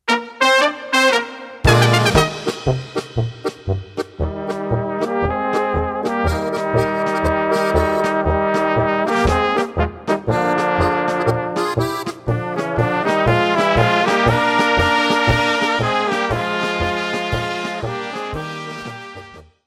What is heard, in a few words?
polka